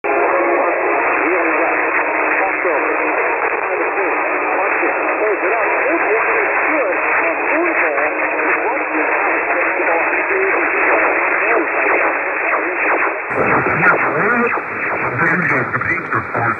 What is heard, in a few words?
Static Loop